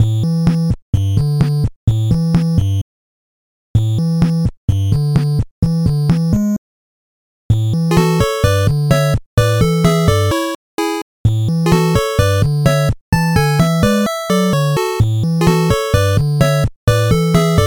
8bit

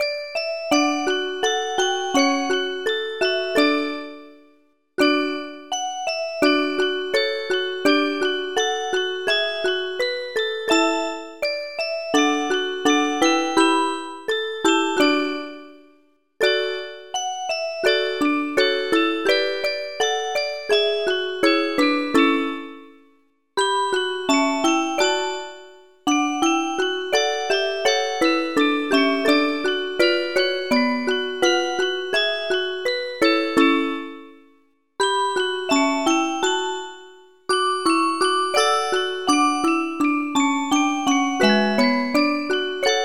golden music box

